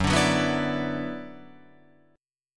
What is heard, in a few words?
Gb-7th Suspended 4th Sharp 5th-Gb-6,9,6,6,7,9-1-down-Guitar-Open F; strummed; JGuitar